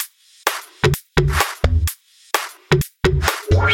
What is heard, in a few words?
House Loop, 128 BPM; Vengeance Samples